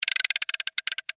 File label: Gear Wind Slow, Large, Plastic, Clicks; Foley; BlastWave